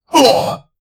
goblin voice normal attac

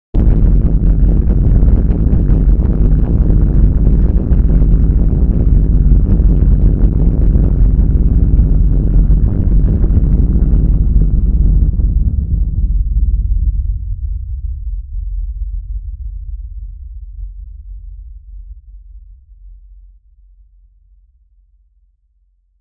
sound of war, history

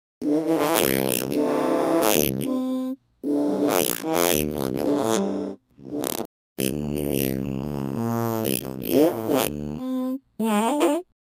harmony from farts

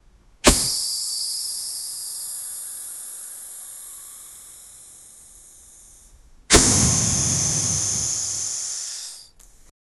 a car tire filled with

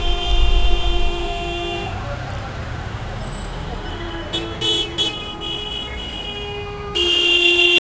distant Dhaka traffic noi, city

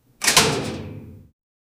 metallic door closing; shutting

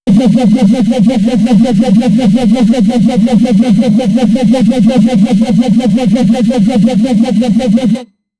synthetic unpleasant soun

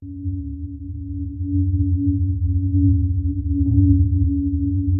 soft echo of footsteps; reflections

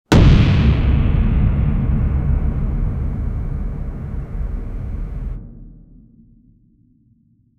an inspiring fantasy soun; monster

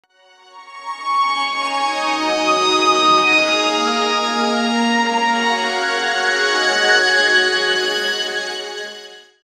emotional synth pad

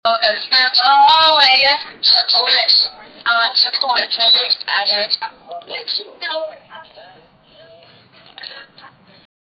walkie talkie

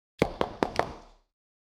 pool snooker balls hitting

